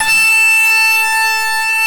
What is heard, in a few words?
SWEEPS; NorthStar - The Wizard VOL-2; TURBOTRONIX